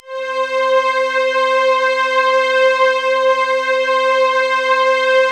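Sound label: orchestra choir, Orchestra Choir Stack; Optical Media International; Sonic Images Library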